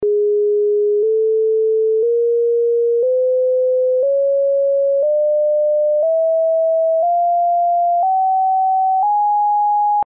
Echelle 9 tons corde Sinus

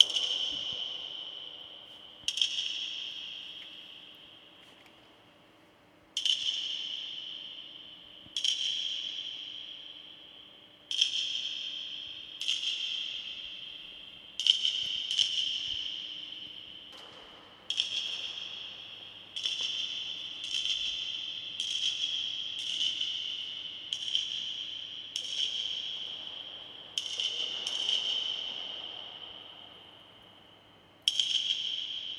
test acoustique avec 2 gong ban=cliqueurs vietnamiens deplaces autour; LA BOULE du CEMES

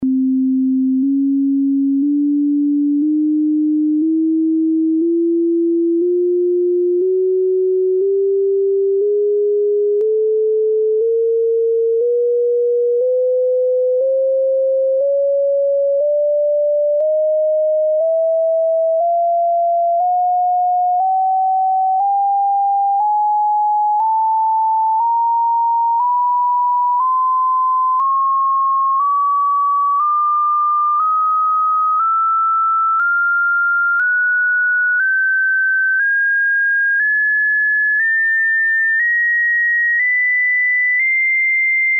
echelle pour la flute en do

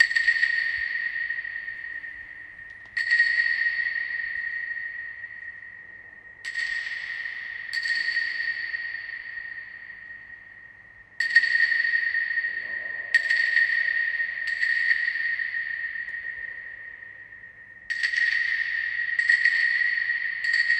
test acoustique avec claves deplaces autour; LA BOULE du CEMES

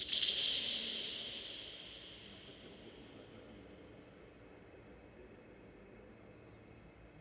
acoustique [prise cardioide=sans espace] source eloignee; LA BOULE du CEMES

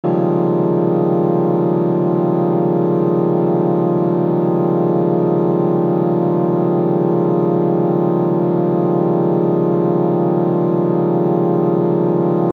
son spectre inharmonique modal phrigien

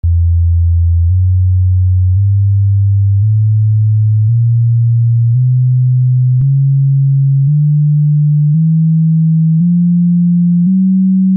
Echelle non octave harmonique 138 cents pour le choeur

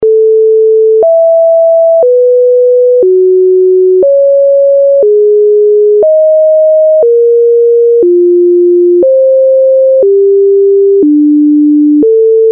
cycle des 5tes injustes

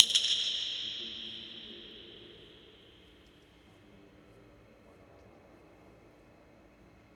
acoustique [prise cardioide=sans espace] source proche; LA BOULE du CEMES

